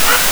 pond

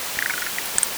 pond

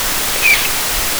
pond

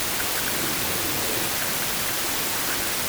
pond

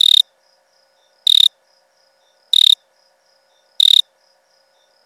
Acoustic male Gryllus assimilis signal; PeerJ Manuscript

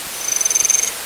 pond

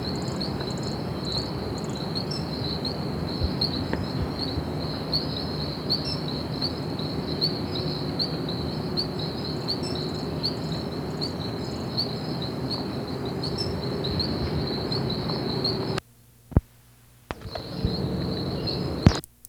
Brachycephalus sulfuratus; Truticultura; MRBornschein

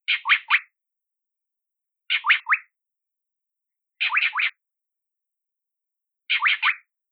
Chocks Hwee-hwee

